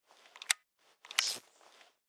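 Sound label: Weapon alien blaster jam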